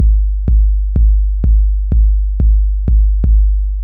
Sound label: deep house drums; Deep House Drums – FL Studio Tutorial